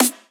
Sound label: Tek Snare C